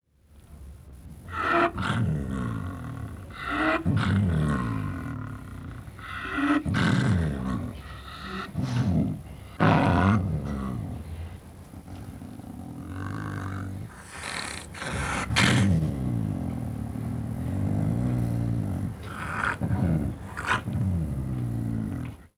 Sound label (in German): Schneeleopard Laute; Guenter Tembrock